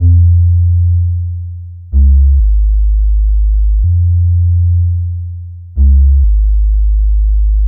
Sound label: bass, Loops